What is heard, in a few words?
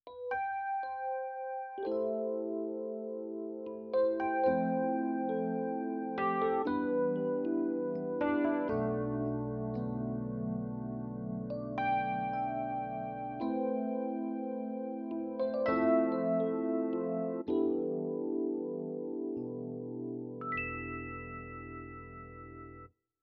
E Piano